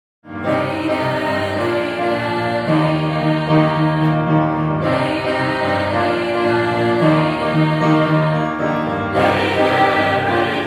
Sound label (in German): 4-stimmiger Kanon